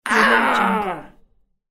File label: cartoon character hurt